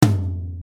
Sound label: tom